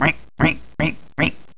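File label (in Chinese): yazi jiaosheng, duck quacking; jiachujiaosheng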